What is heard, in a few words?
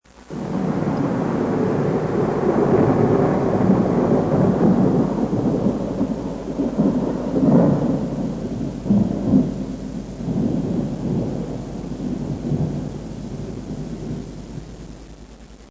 Thunder